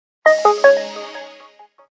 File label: Power off, phone; LG; KV230